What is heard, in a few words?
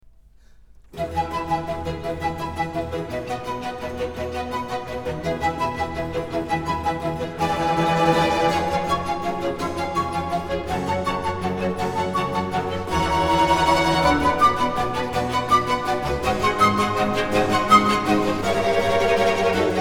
Concerto For Flute And Strings In G Minor, Presto; Antonio Vivaldi; Op.10, No.2, R.439 ''La Notte''